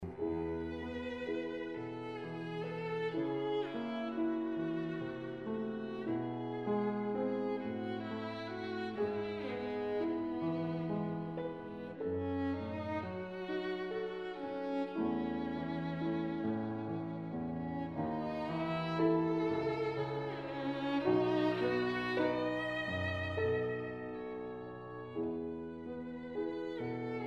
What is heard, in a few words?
trio